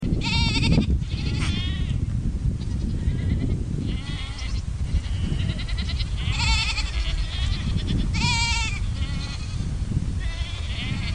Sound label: Mongolia Sheep